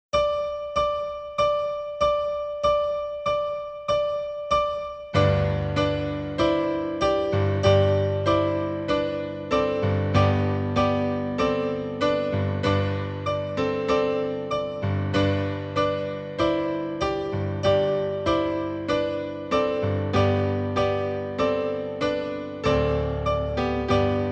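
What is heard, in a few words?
Ode To Joy,recorder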